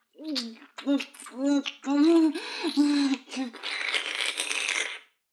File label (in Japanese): フェラ音声; 逆時間停止されて